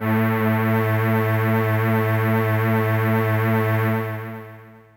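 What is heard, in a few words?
synth A1, Ensemble, FAIRLIGHT; Best Service ProSamples vol.55 - Retro Sampler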